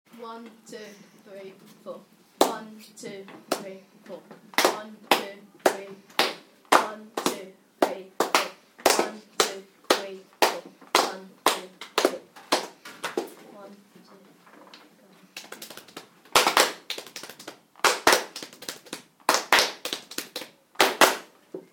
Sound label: polished rhythm composition